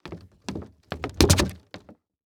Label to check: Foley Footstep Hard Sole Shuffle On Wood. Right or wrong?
Right